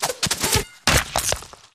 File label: Beheading Sword, whooshes & swishes